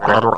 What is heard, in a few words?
gurp; opentdm; Morbo